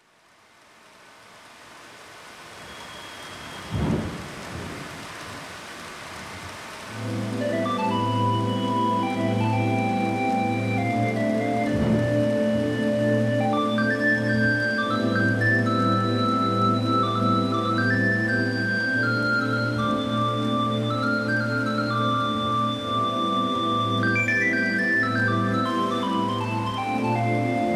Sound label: Jazzy Chillout